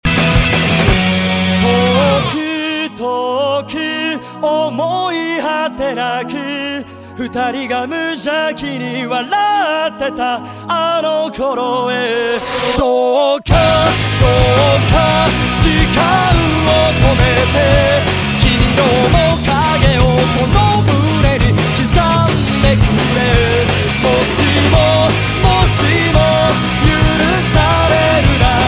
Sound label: ending; fullmetal alchemist